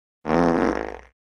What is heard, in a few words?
Fart Medium Fart Close Perspective; Human Sounds